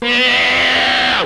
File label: FLUTE